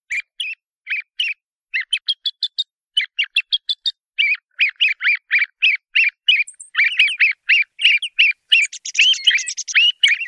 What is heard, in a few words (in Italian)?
tordo voce schiamazzo